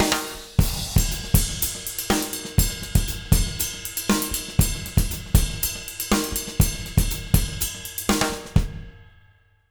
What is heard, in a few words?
120 JROCK, Drumloops; Jazz Latin; USB Soundscan vol.08; AKAI